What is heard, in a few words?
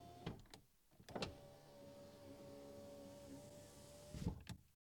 Car Window